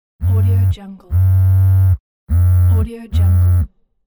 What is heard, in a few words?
softy vibro mode